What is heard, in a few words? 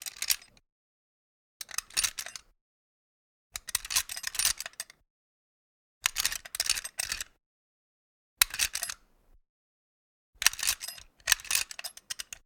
lock outdoor opening; Sonniss GDC